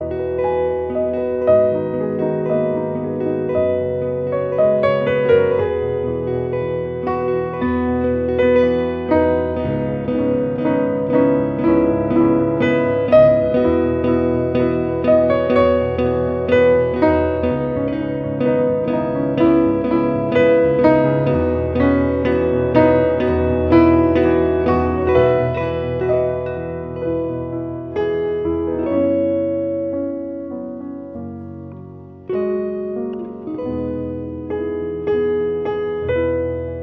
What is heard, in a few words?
Many Drops, Piano and E-G